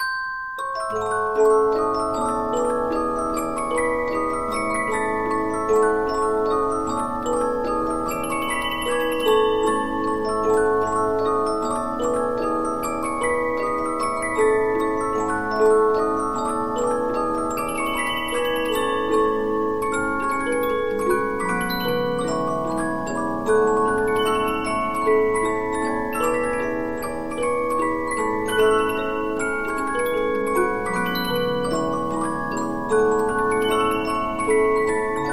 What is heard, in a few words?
Polyphon, 15inch disc musicbox